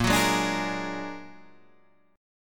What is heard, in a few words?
A sharp Augmented 7th, Guitar Standard; A#+7 strummed; 6,9,6,7,9,6; guitar chord